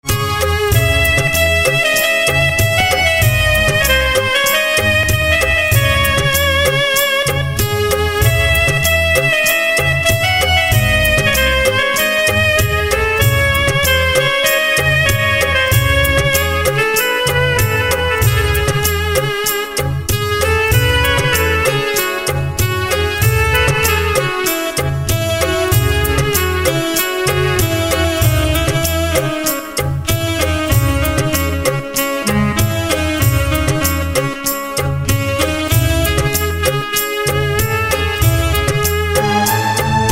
Saxophone

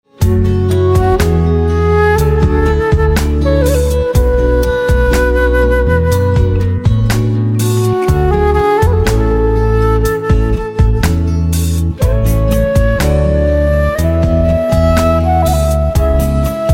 Flute

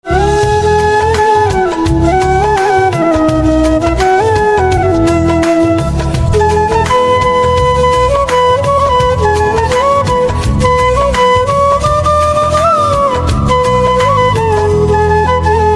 Flute

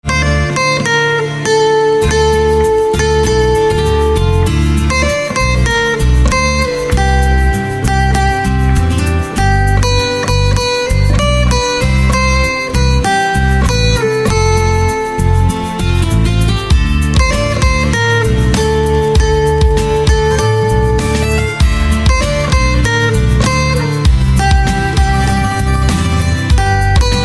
Guitar, instrumental ringtones

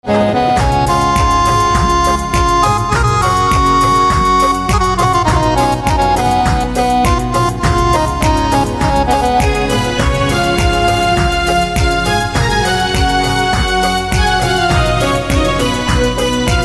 Saxophone

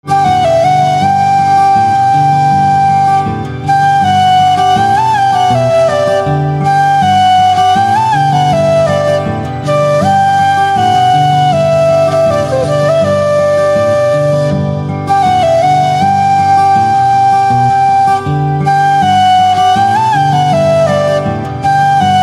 Flute Instrumental Ringtone

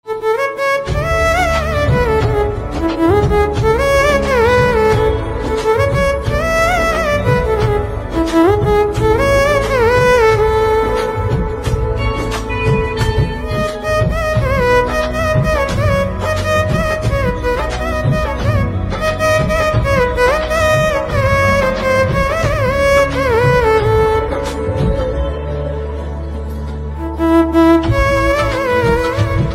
Violin

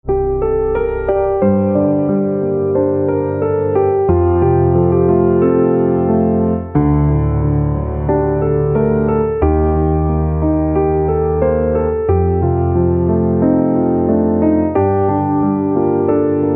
Piano Silent

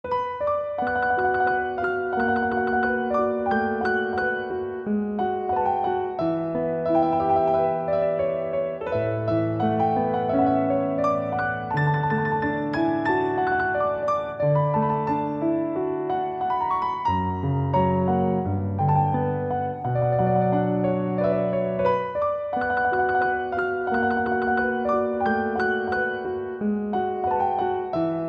Piano

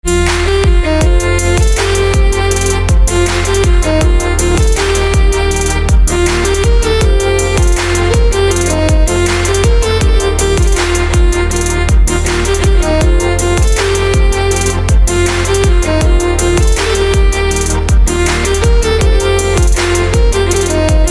Pianica

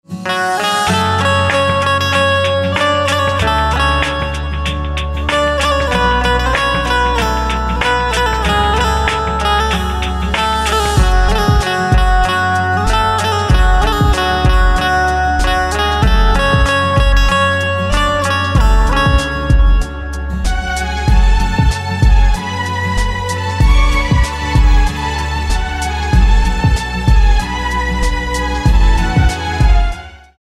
Banjo

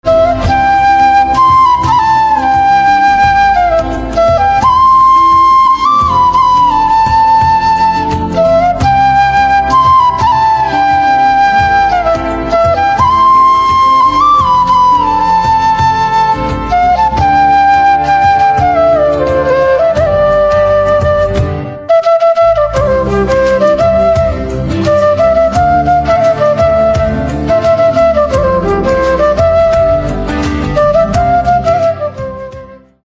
Flute

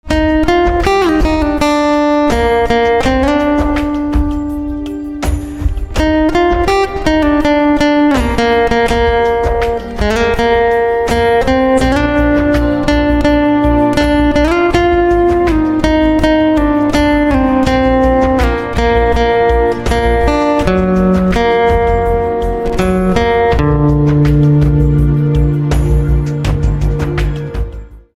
Guitar

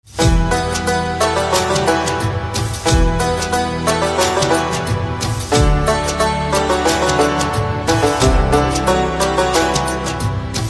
Santoor

